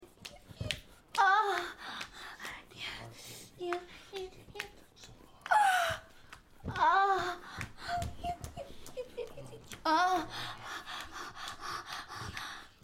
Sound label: moan; addams